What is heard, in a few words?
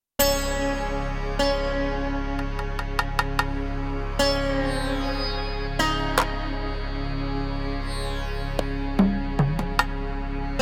Veena